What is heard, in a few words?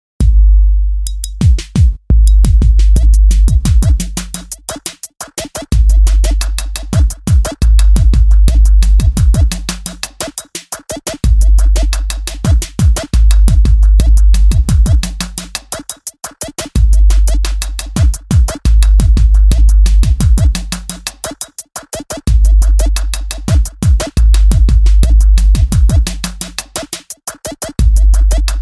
bass theme, ringtones